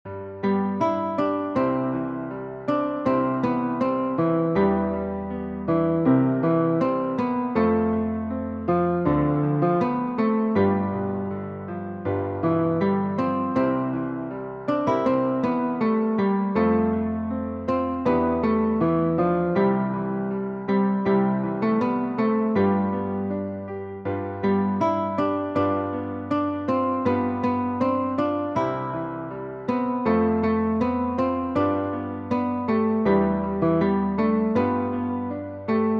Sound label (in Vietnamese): NHAC NEN